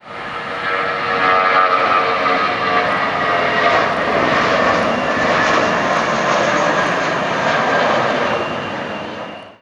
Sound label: airbus a320; laxspotter97; simutrans; Pak128.Britain-Ex